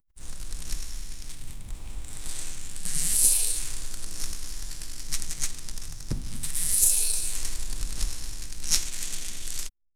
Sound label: flying rope lasso sound, cloth